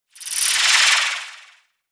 Hip Sways; Evolve; Wraith

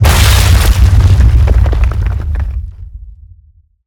Mortar Cannon Impact; Evolve; Behemoth

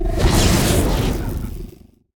Lightning Ball Throw; Evolve; Kraken

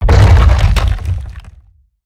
Roll Surface End; Evolve; Behemoth

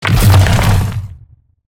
Rock Throw Impact; Evolve; Meteor Goliath

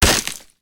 Teleporter Super Nova Blood Splat; Evolve; Wraith

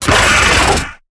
Pain Light; Evolve; Gorgon; Lurker

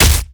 Collisions Melee Impact; Lurker; Evolve; Gorgon

